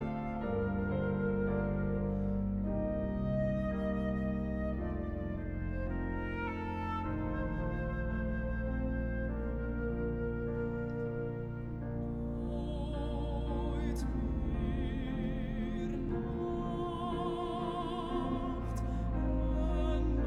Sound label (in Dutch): Koor gemengd; Canate Deo